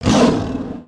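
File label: Sabertooth Disapprove; creatures; pets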